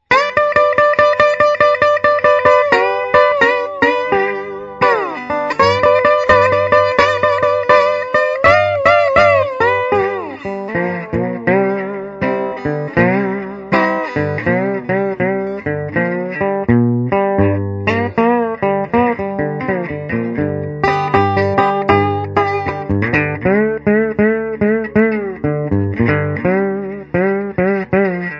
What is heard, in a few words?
Muddy Water Blues